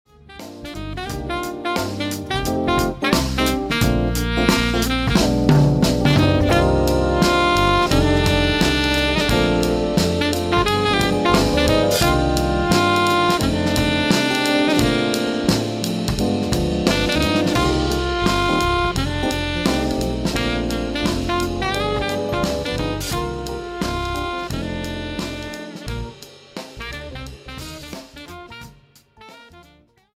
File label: pop jazz, Jazz Trio